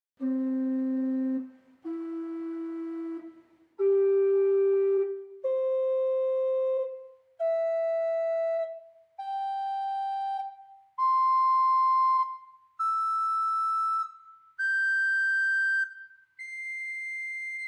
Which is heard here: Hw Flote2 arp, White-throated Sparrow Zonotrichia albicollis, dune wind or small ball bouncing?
Hw Flote2 arp